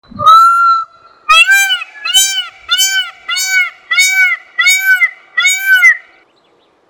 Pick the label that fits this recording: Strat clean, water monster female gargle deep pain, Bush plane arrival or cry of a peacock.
cry of a peacock